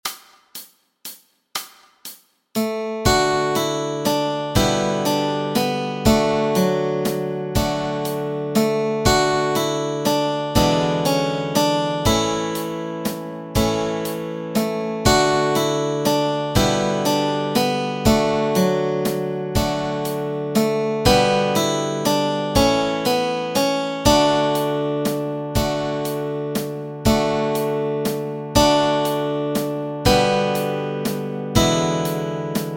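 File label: Melody and Chords Track, Easy Guitar and Ukulele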